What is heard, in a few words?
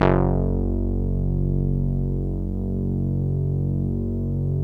ODYSSEY Vintage Keyboards F#1; Big Fish Audio - First Call Vintage Keyboards